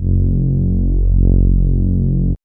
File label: MOOG; AMG - Vince Clarke Lucky Bastard